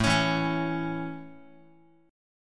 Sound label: A Major A, Guitar; strummed